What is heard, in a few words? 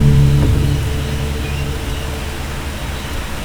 piano